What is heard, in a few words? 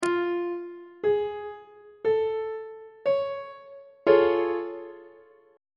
b double flat augmented major 7th 2nd inversion on treble clef